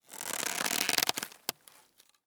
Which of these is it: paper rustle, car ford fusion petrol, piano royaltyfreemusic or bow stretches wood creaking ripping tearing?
bow stretches wood creaking ripping tearing